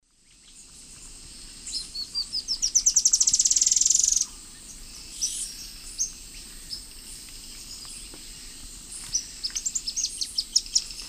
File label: Terenura spodioptila, song and calls; S. America; Eastern Lowlands